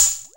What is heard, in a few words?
DR. LOOP, DRUMS; X-Static Goldmine 3